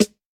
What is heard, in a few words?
00s smooth snare drum sample g sharp key